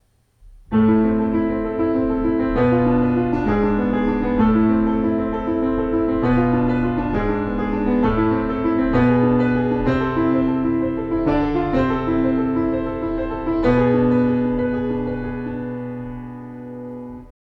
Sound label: Triplet Var, piano